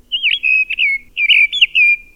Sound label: mistle thrush